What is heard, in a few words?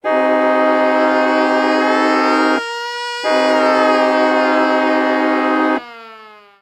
Hockey Goal, Hockey Horns & Buzzers; SFX Kit; NewYork